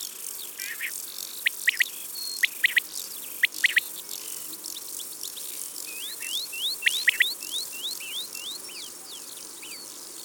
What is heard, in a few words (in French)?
Caille des bles, Concerts naturels de l'Isere; Studio Les Trois Becs